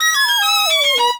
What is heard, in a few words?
Beat